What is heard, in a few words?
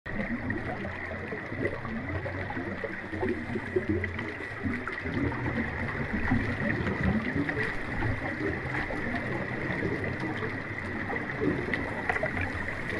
diver